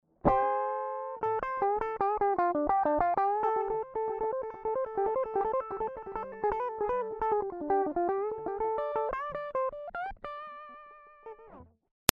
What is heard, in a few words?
American hi-gain lead